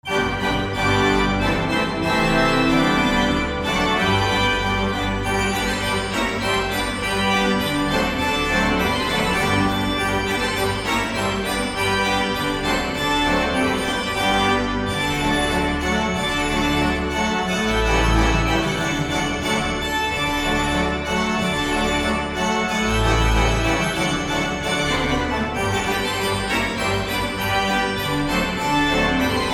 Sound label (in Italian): Marcia, organi; APPENINO BOLOGNESE; Padre Davide da Bergamo